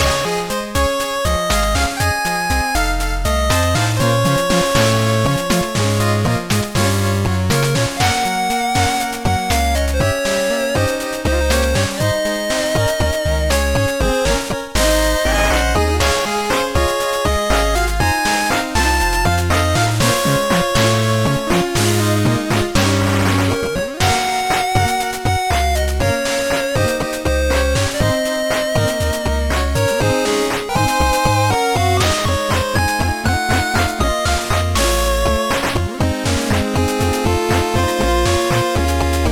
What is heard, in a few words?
8Bit; HGSS; Azalea Town